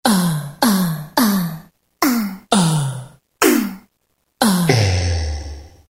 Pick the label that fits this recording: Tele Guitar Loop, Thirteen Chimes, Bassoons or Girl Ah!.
Girl Ah!